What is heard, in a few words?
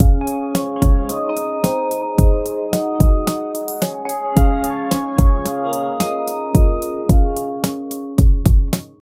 soft uplifting lo fi